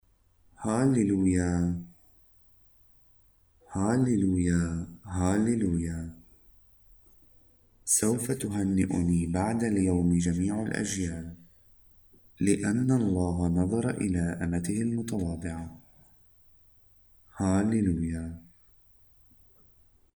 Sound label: Halleluiah, arabic mass